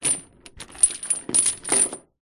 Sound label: industrial chain large set down on hard floor; zapsplat